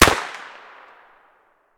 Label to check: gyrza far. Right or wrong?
Right